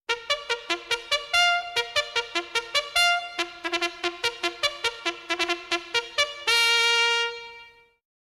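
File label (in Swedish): Tropp jägarhorn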